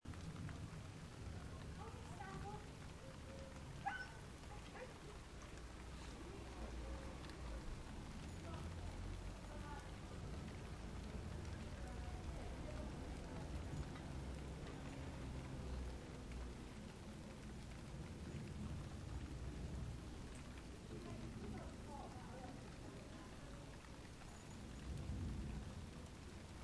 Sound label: rain and Glastonbury ambience